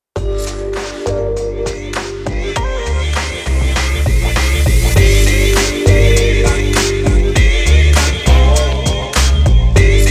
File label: Hip Hop